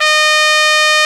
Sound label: Soft Trumpet G3